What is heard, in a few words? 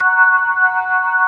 B3 HI SLOW; E-MU Formula 4000 Series Vol. 3; Analog Odyssey; VK Instruments